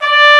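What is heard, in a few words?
Trumpet mf D4; Digital Sound Factory Virtuoso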